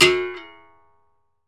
STICK MUTE, Berimbau; E-MU Producer Series Vol. 2; More Studio Essentials; Composer; Berimbau Pallet

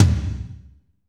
tom, Kit Wet; Northstar - Drumscapes Roland

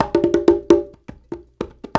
120 COMP, World Dance; Spectrasonics; Supreme Beats; Compasa

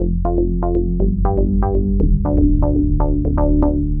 Muff Bass, Bass Foundations; Sonic Foundry (Sony Creative Software); The Electronic Music Manuscript Collection

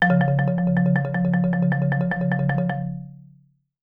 Marimba roll F2; Sampleheads - Dave Samuels Marimba & Vibes

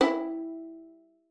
FING E4, VIOLIN; Xsample VOL-5

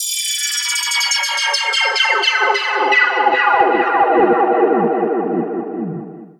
downlifter 150 8 bar resonator; Virtual Riot

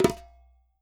WORLD DRUMS MIXED DRUMS; World Instruments 2; PS MIXED DRUMS

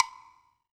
WOOD BLOC, HR-16 Drum Mashines; EdgeSounds

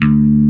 PUMP BASS D3; Drums In Your FACE!

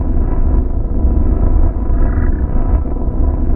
DATA BARGE; Spectrasonic Distorted Reality 1

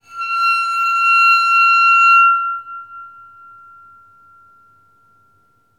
WATERPHONE G; ILIO; Synclavier Percussion 2; World & Orchestral

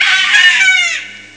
skriik; ST-XX Amiga Soundtracker Sample Packs

